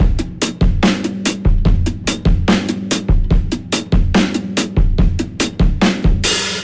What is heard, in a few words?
big boom, boombap drum loops